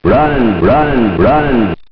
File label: runrunrun